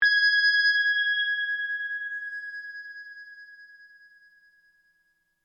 Chime Bell High, Scifi, Chime, Bell, High, Pitch, Science, Fiction; Tech